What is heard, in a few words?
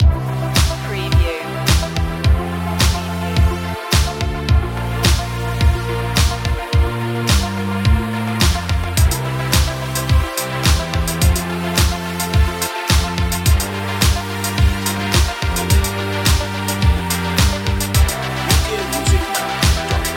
synthwave feeling